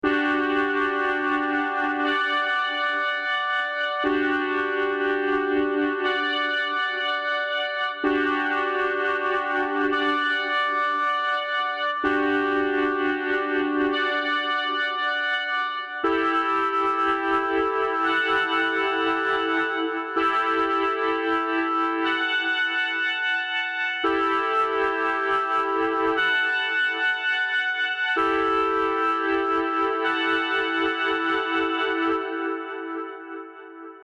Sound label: Retro Synth Chords